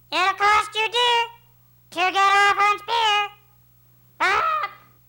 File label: Parrot